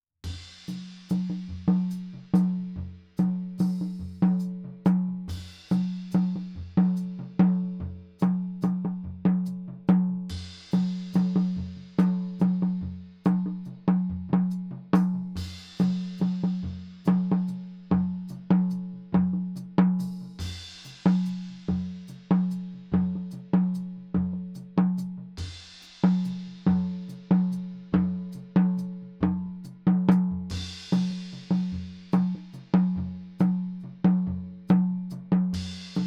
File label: strangehome hi tom, drums; film